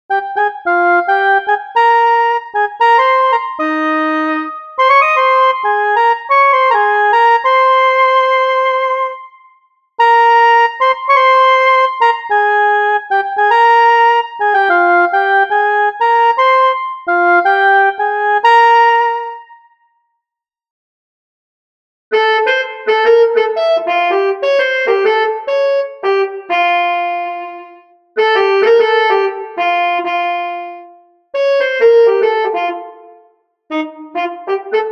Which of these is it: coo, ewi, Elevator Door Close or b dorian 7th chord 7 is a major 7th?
ewi